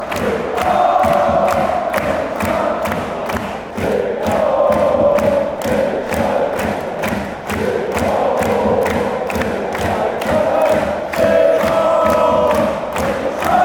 stand up fan chants; schalke

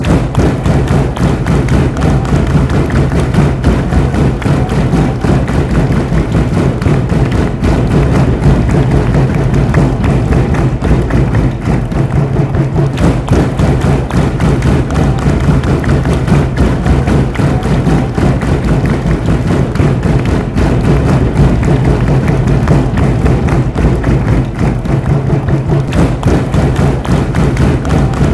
claps, chants

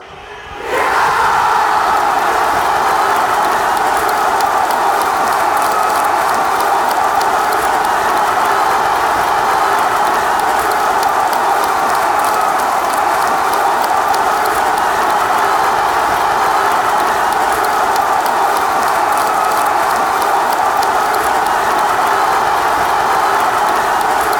goal, fanchants

